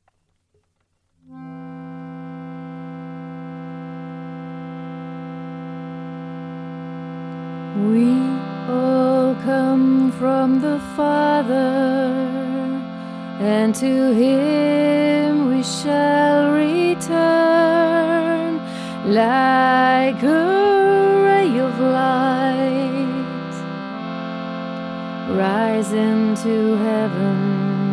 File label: Halleluja